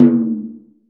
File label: FRAME DR, ETHNIC PERCUSSION; AKAI S6000 CD-ROM - Volume 5